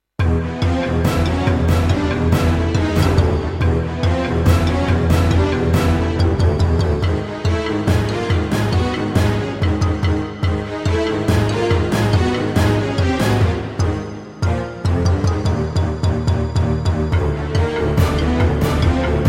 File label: Hit; Combi; Score Sketcher